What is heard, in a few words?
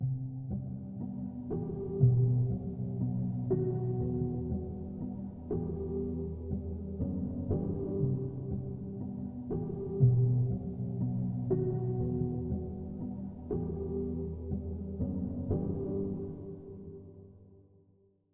120 BPM E Min Key, Hip Hop; Melody Loops; Loop Stems; Cymatics; Hibiki